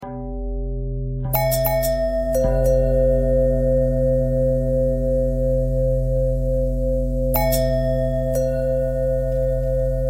long bell bell threads, bells

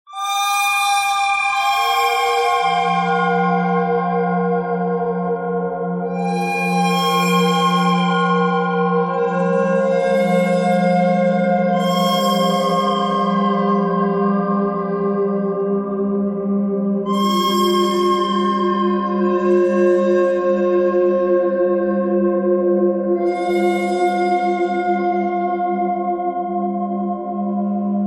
bird thread simple

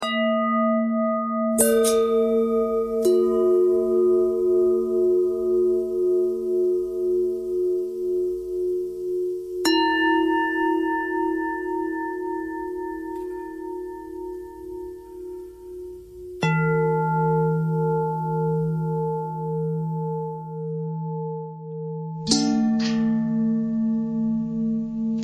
long bell bell bend threads

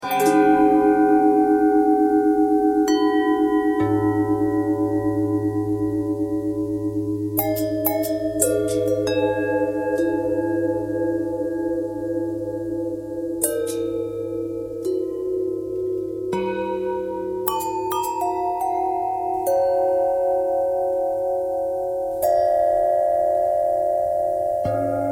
bells bendtwist

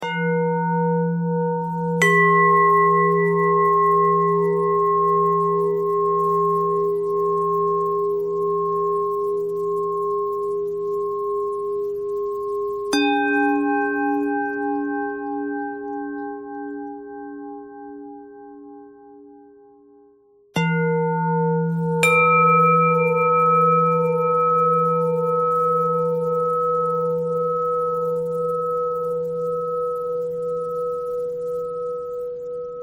bell thread bend thread

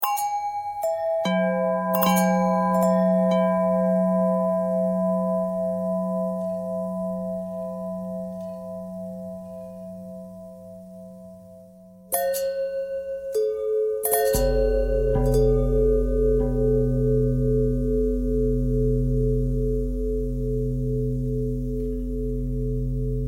bell long bell thread pentatonic; bells

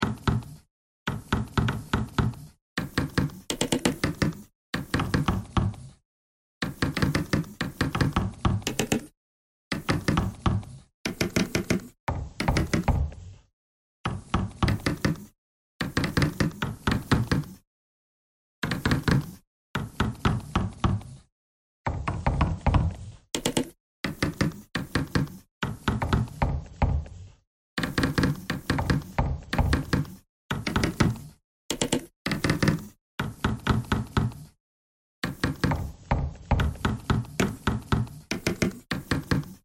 bagpipe noise

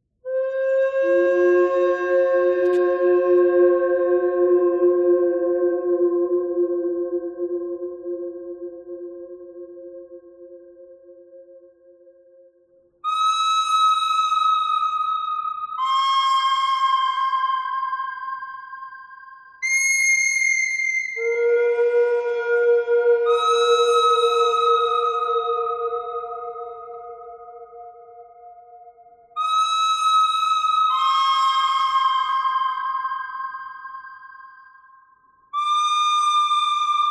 bird thread harmonic

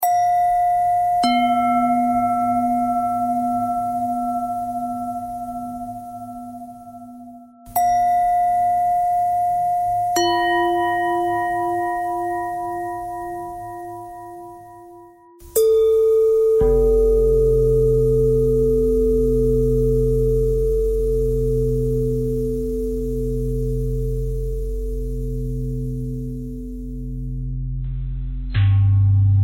bell threads

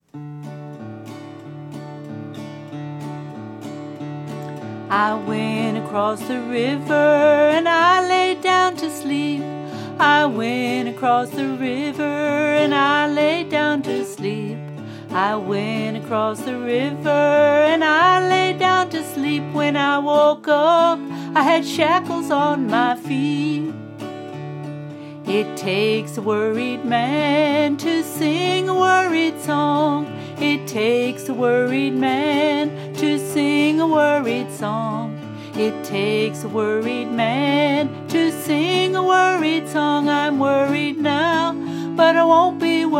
worried man blues, female

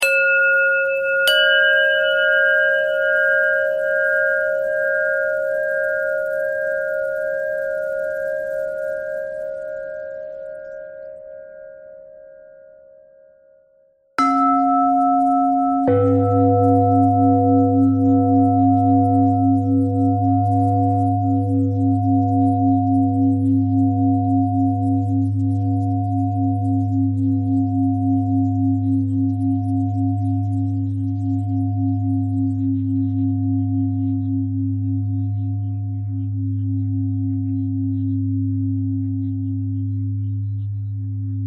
bell bell thread simple, bells

